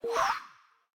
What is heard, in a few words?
menu activation; Pitch Black - A Detective's Demise